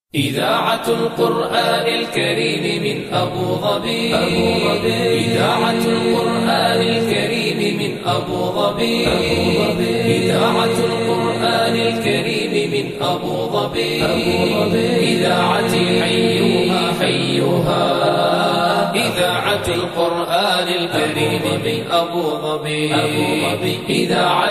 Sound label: Vocals Only, nasheeds; Abu Dhabi